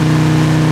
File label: GTO rpm, Engine; Cars